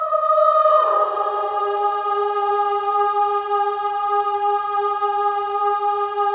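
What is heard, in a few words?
Voices, INTERVALS, 5TH DOWN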